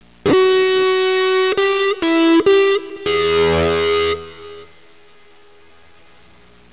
elec guitar

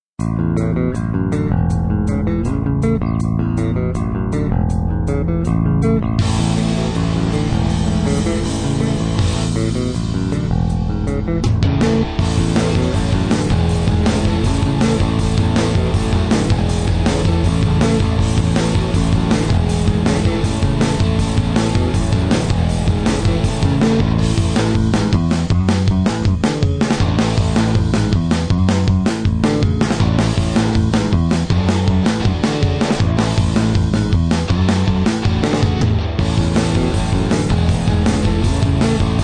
medium Bass; battle of the bands

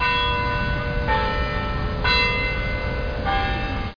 CHURCH

